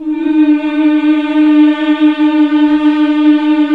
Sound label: Nuns Mmmhh; Sound & Vision - Gigapack II